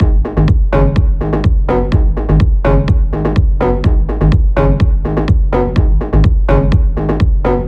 KICK BASS AND SYNTH HOOK

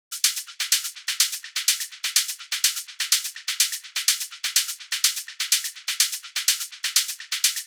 TOPS SHAKER PROCESSED

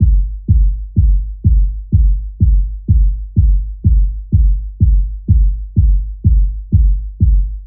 Kick

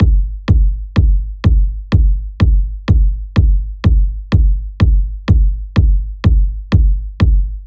Kick

